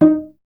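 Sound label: STR VC D#0, strings cello; Miroslav Vitous; Solo Instruments VOL-1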